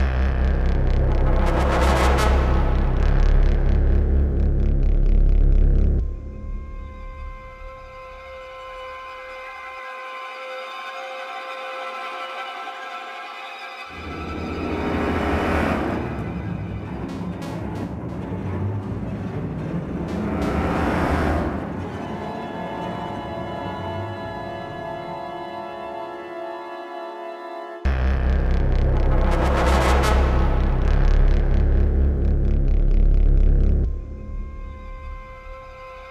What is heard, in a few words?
Nighthunter Near; dying light gamerip 2015